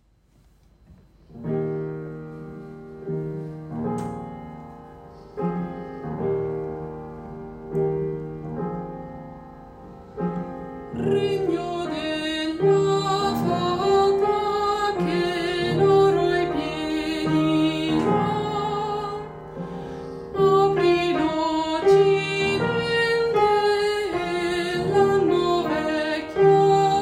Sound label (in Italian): Britten piano e voce